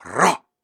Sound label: Grunts and Chants, Viking Vocal